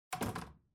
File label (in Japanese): マジックテープを耳に貼るASMR ASMRver